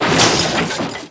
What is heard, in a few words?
coelacanth die